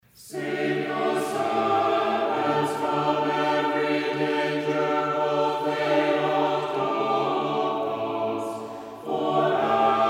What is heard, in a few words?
Troparia Tone 2